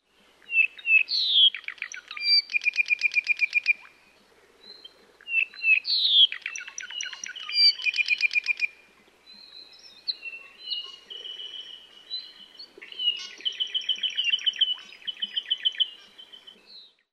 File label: kauai apapane